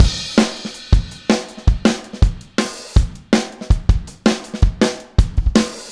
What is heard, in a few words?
Take Your Toms Off Verse, Drums; Sonic Foundry (Sony Creative Software); Drums from the Big Room The Mixes; Demo Projects